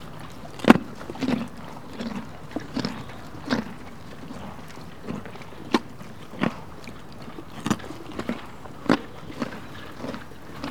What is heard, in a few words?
Horse Chewing